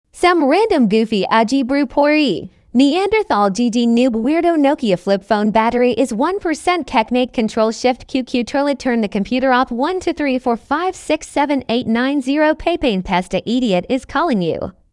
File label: funny ringtone